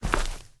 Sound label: step grass; STALZONE; minecraft